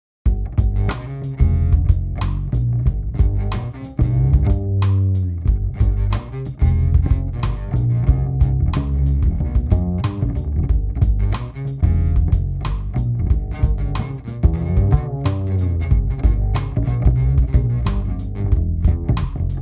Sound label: double bass